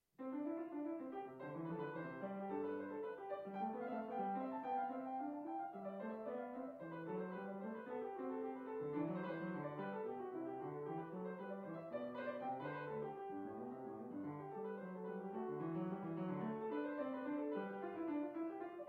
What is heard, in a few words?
Piano; Bach Two Part Invention No 1 BWV 772